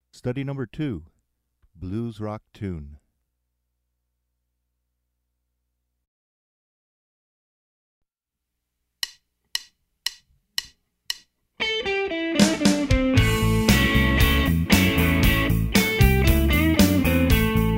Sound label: Blues Rock Tune